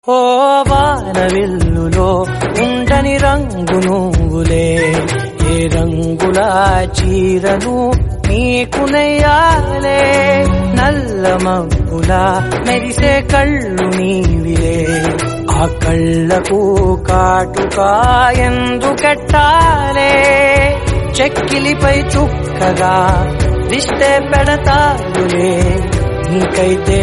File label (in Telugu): telugu love song ringtones